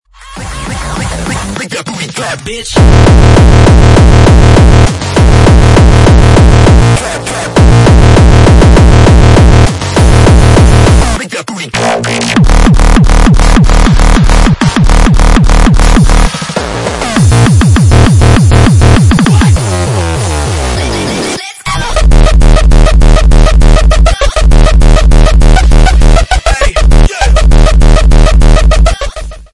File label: hardcore